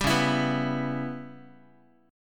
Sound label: Db Minor 7th Flat 5th Db down Guitar; strummed; JGuitar